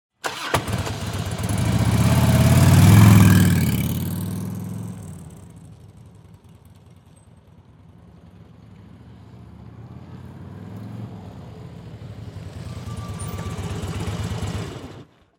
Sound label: Transportation Auto Motorcycle Start Drive Return Off